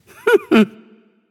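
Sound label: "heh heh", nonverbal; smite; voicepack; khepri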